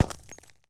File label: Bullet Hit Wall